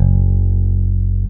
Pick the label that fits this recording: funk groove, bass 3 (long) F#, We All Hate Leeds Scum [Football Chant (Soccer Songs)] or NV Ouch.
bass 3 (long) F#